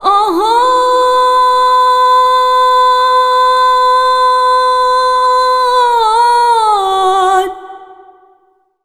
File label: SINGER, Arabian Traditions